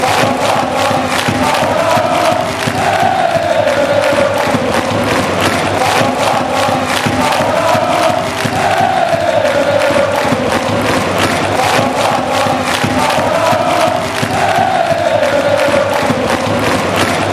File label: lalala lalala hehehe allez lensois, fan chants